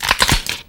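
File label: bone crack; E-MU Producer Series Vol. 3 – Hollywood Sound Effects; Science Fiction; Pumpkin Head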